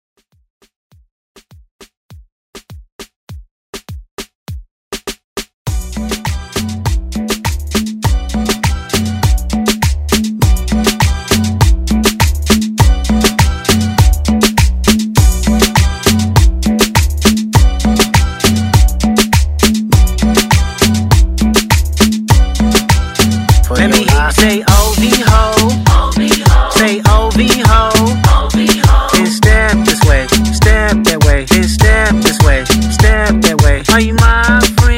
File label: Moombah